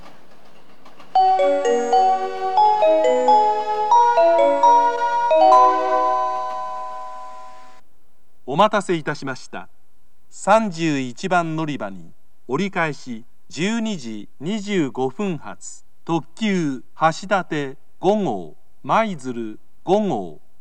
limited express, kyoto; hashidate5; kumihama; maizuru5; higashi-maizuru; jr-west

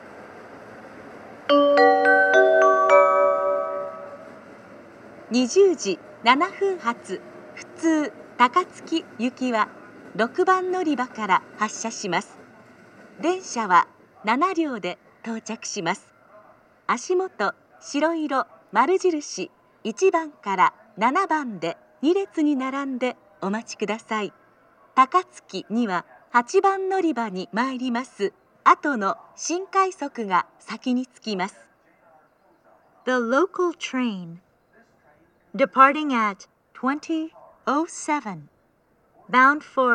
amagasaki local takatsuki; station; jr-west

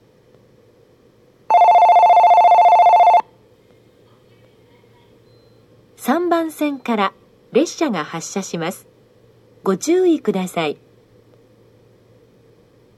biwajima, station; jr central

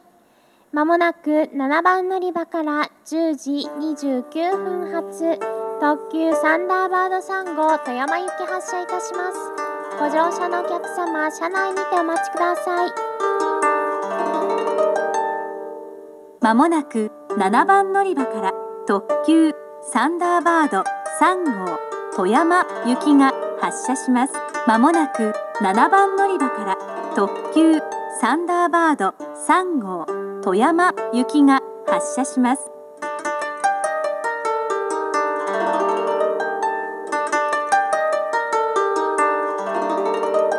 kanazawa limited express; thunderbird3; toyama; jr-west

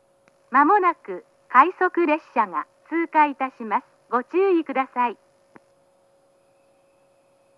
rapid pass; satsukino; jr-east

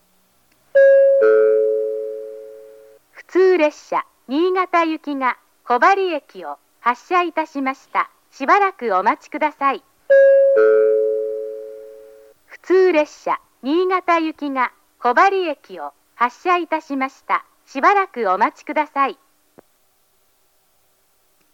aoyama local, station; jr-east; niigata

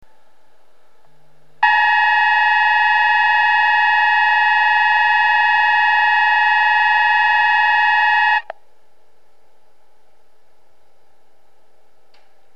seibukyujo-mae, seibu station

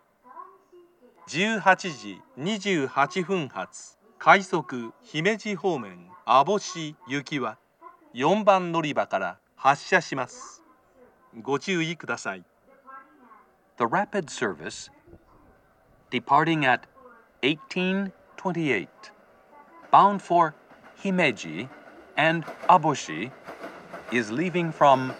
amagasaki rapid, station; aboshi; jr-west

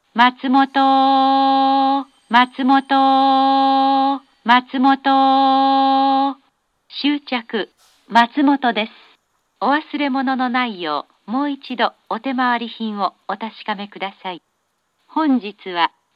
matsumoto turn limited express, station; jr-east; azusa16

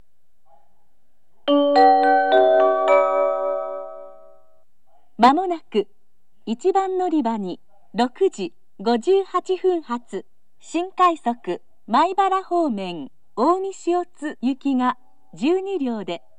special rapid service, station; omi-shiotsu; sannomiya

